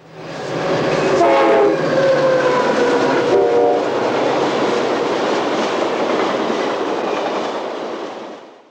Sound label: close